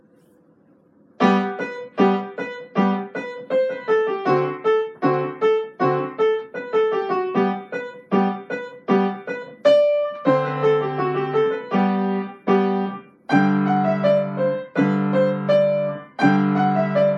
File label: Irish Folk Tune; The Galway Piper